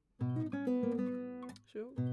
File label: GTR